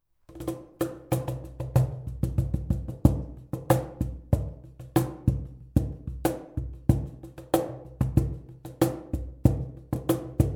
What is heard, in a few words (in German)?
R 07 Cajon Raumaufnahme 1m Distanz geschlossener Schieber